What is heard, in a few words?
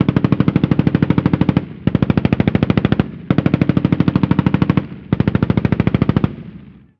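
gun assault medium; Call of Duty Modern Warfare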